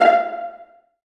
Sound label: Via Pzz F4; Digital Sound Factory Virtuoso